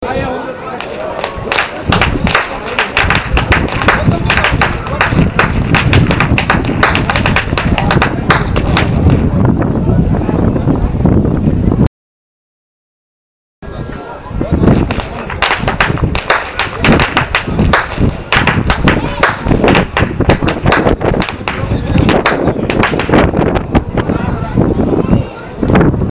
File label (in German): schnalzen, jugend; roth